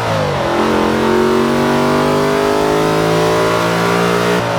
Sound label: second, vehicles